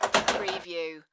Billiard, Pool, Snooker - Ball delivery mechanism in table